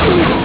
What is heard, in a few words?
close door